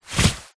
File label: foley clothes running shorts polyester single leg move walk movement